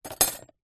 household cutlery metal single item place into plastic container